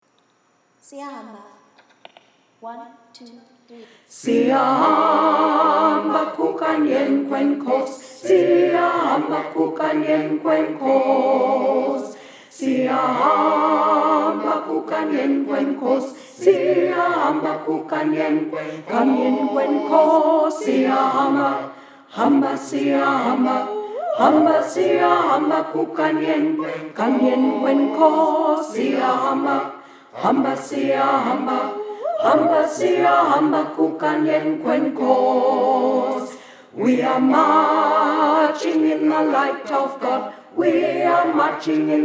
tenor siyahamba

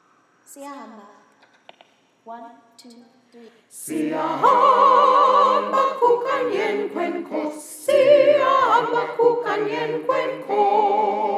sop siyahamba

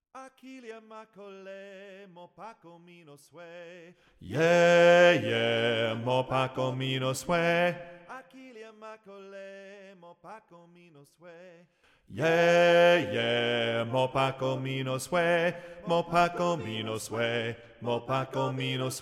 bass

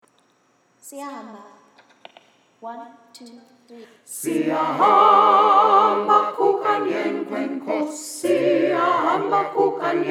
alto siyahamba

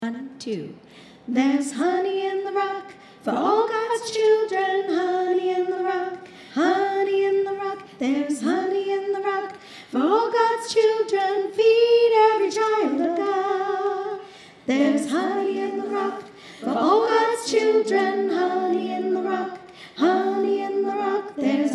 honey in the rock soprano

